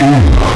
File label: fall; Alien Arena; nemesis